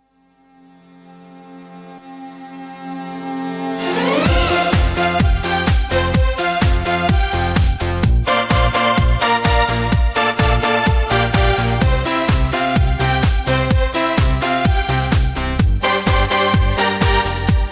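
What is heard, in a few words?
ai mix Style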